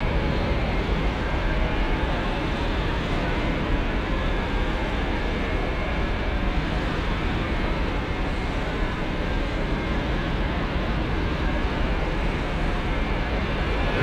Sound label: swirling tonal air movement in tunnel; magic scifi